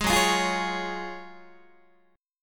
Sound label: Gb Major 7th Suspended 2nd, Guitar; GbM7sus2 strummed; JGuitar